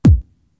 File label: electro kick; Boy In A Band Electro Kit